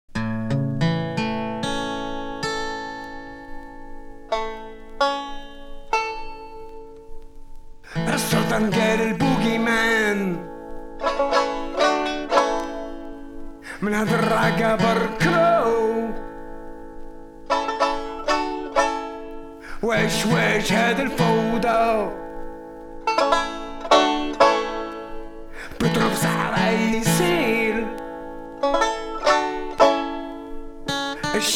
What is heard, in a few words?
Rock the banjos